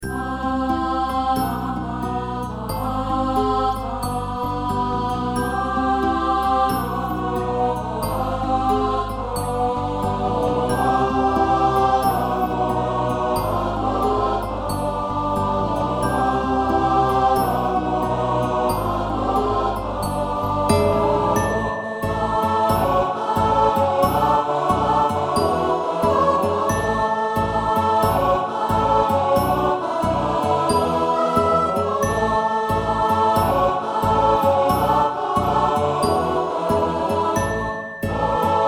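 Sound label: All, choir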